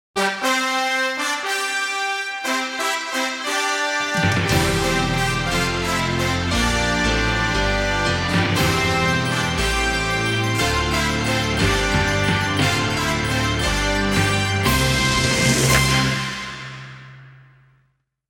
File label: Fanfare long; IIHF